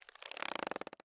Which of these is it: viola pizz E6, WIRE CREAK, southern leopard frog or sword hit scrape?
WIRE CREAK